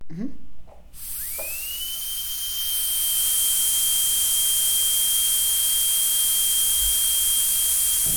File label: Dentist Drill